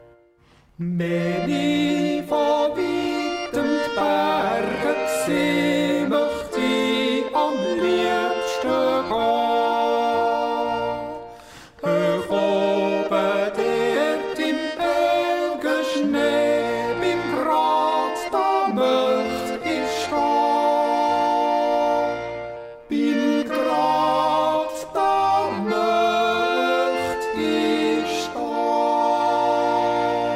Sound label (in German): Duett